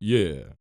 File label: perc voice, Roland MC-909